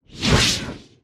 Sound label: Epic Swishes; SwooshSounds-2